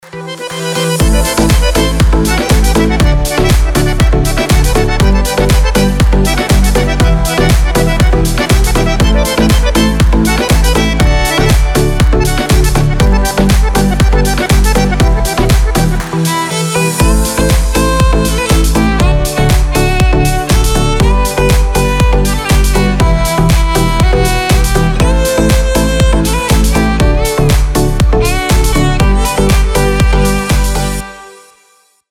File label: duoviolins